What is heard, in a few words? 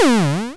hurt